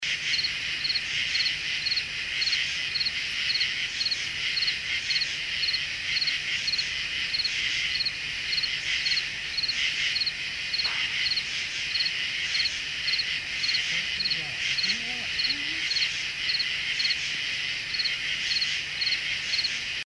Cemetery bang; Case 016 Hebrew-Bryant

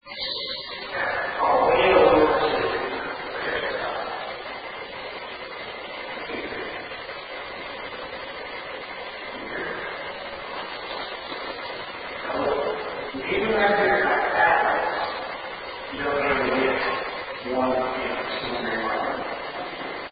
Second Floor Unexplained noise; Case 024 Pocahontas Fuel Building II

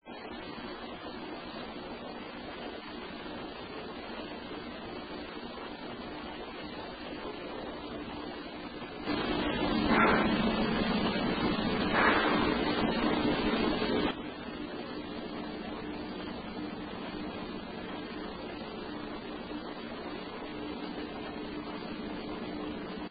Unexplained Noise or Voice; Mahood Hall Bluefield State College; 1st Floor

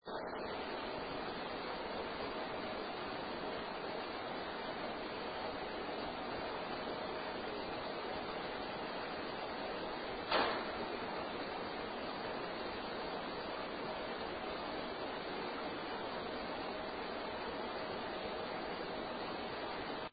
Art Room knock; Case 012 Pocahontas Fuel Building 2nd Floor

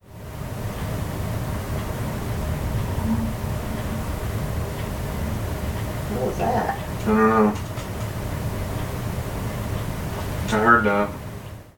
Unexplained Noise Amplified

